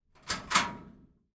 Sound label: steel lockers open close full length